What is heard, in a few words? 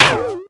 Bow dry fire